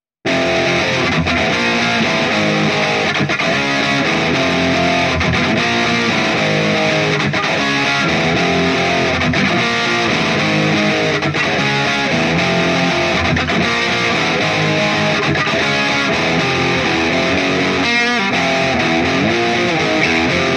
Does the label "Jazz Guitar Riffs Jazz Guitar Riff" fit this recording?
Yes